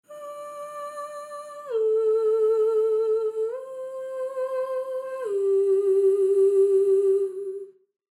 Vocals Legato Mms ModWheel

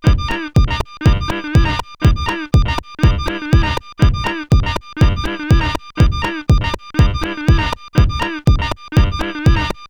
SOMA ROAT Groove Sync ext BD

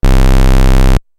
Roland SH-101 Sub

